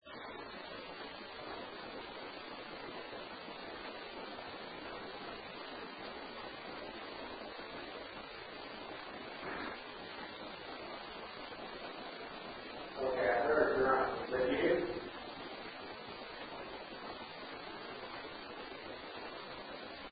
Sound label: Unknown Breath; Case 020 Ballard Building